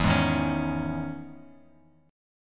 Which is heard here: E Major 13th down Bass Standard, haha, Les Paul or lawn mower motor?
E Major 13th down Bass Standard